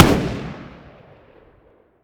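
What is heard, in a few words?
weapon ar fire player atmo exterior; gmod; viper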